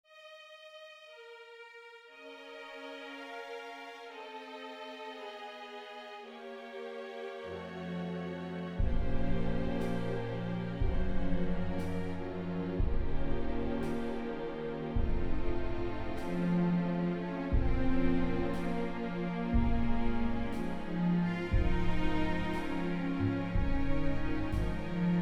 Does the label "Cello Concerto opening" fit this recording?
Yes